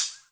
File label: HH, HIHAT HIP; Best Service Dance Mega Drums